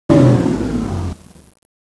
shutdown; vendetta; ambience

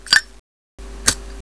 zippo; ambience; blackops

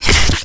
pickup card; vendetta

